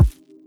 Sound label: Fuzzy Kick; Drums; BB 2HTC Samples Vol 4 Addendum